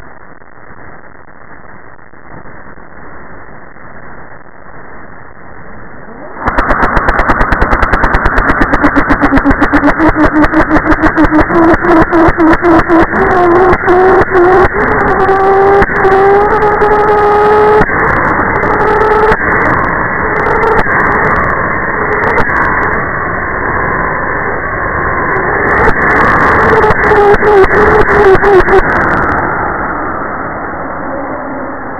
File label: Modulated Interchange Modes, Plasma Sounds